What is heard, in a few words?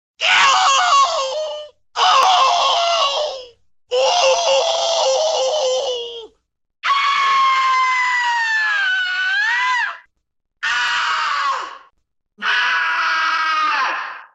Animated scream